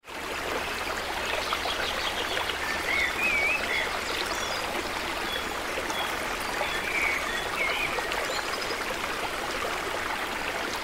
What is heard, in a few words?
Forest ambience nearby fast river with birds in the trees